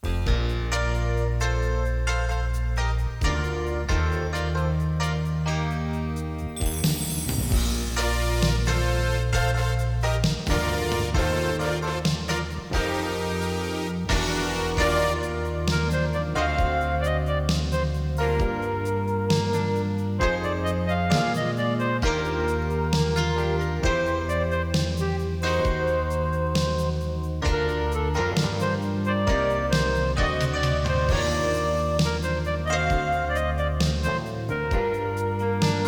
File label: SONY C-90 HF-B CAL DOLBY OFF; ★キャリブレーション後、ドルビーNRはOFFの再生音★